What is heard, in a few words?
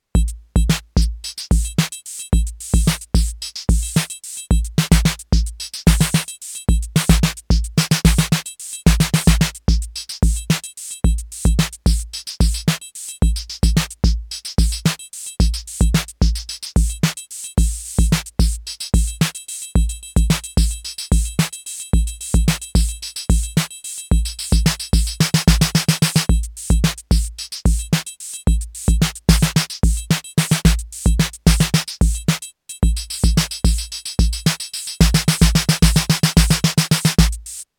Clock retrigger; Blck Noir